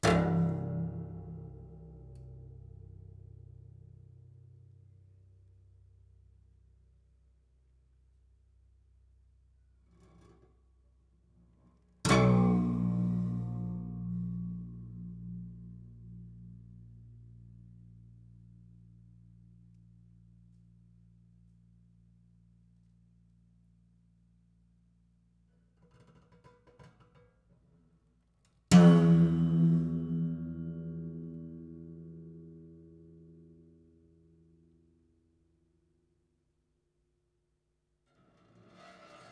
Plier Pluck, Piano Destruction

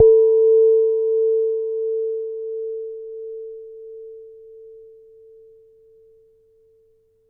Soft Hit, Large Wine Glass

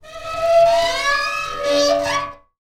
Pane Of Glass Rub

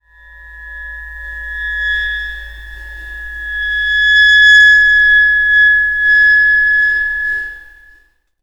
Cymbal; Percussion Samples

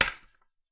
Palette, WoodenPalette

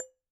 Bottle Struck

